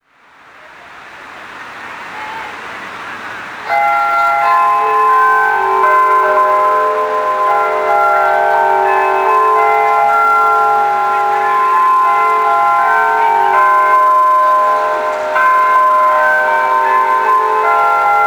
Barce Bells; ambience; Project Gotham Racing 2

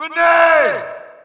Shout, audience; UFC Tapout 2

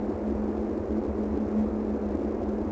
ambient; flames

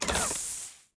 lever

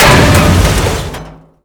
Impact Large; Totaled; XBOX

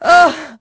dead wom, female; voices; Pirates Of The Caribbean

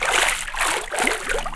Bubbles; Finding Nemo; XBOX

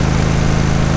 generator; Tony Hawk's Project 8; XBOX; cretepark

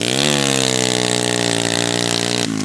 power, Engine

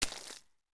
FS Mud, footsteps

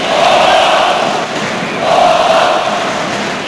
Chant, Crowd; David Beckham Soccer; XBOX

